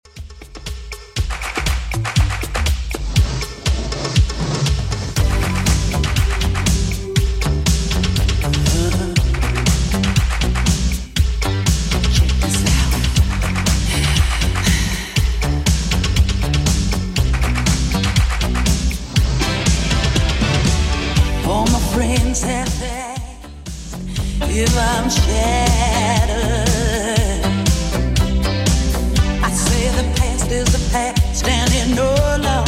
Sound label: 80s Redrum, 120 bpm